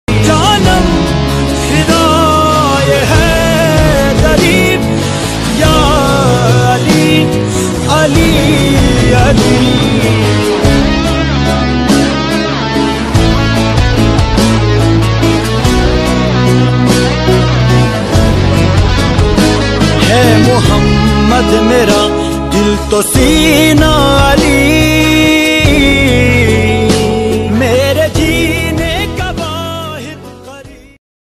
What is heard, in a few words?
ringtone flute Instrumental Ringtone